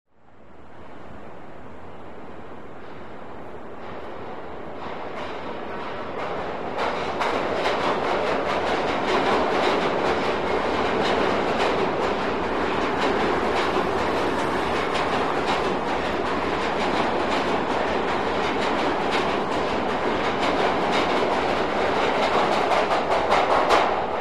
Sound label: Subway Train Pass By F, subway